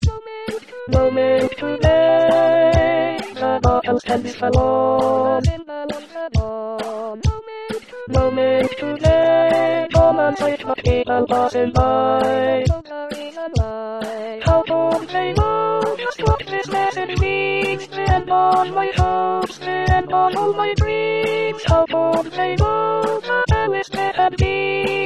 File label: Alto, Chorale